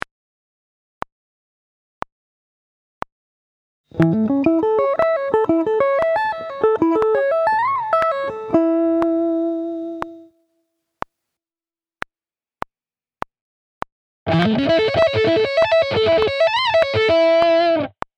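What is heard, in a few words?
Sweep Picking, guitar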